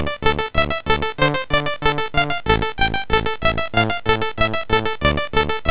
Intro, sid MOS6581R3; Radio Pack 08

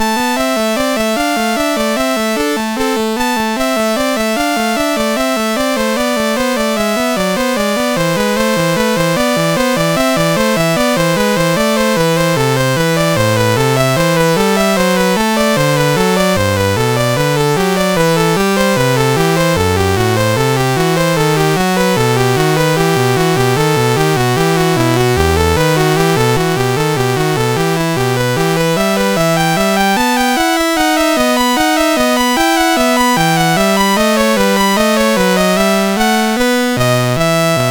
English Suite 2 Bourree, MOS6581R2; Lord UK

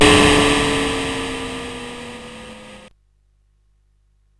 Synth Sample, CSG8580R5

